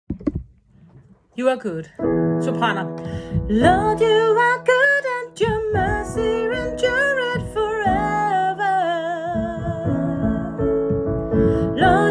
you are good sopran

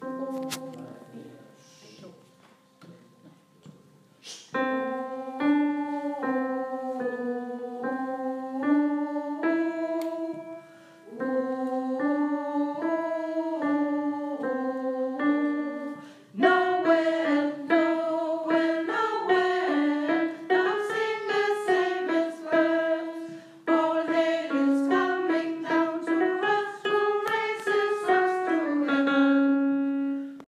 the babe in bethlems manger laid alt

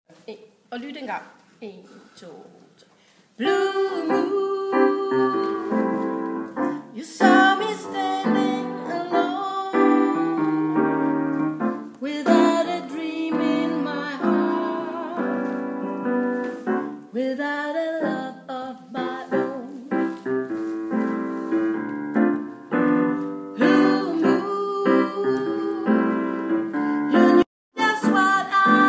sopran

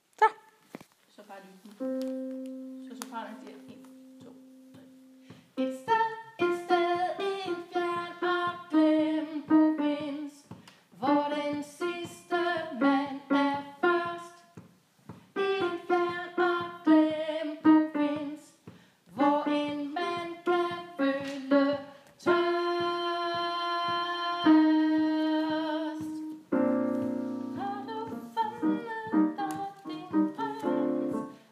refrain alt

